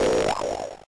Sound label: Drop Item; Beyond Oasis